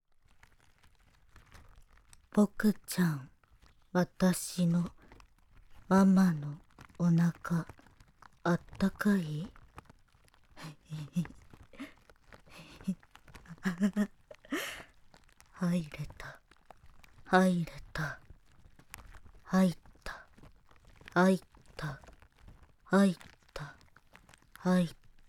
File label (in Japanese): 耳舐め オホ声 無限 手コキ